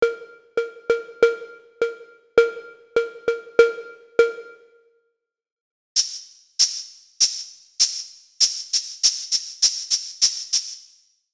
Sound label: More Cowbell, mono synth; reaper